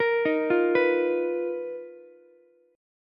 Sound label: B Major 7th Flat 5th B down Ukulele; BM7b5; arpeggiated; JGuitar